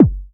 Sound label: Buchla kick; E-MU Formula 4000 Series Vol. 2; OrbitPercMaster